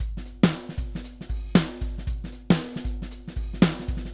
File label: ezdrummer dry; toontrack testbericht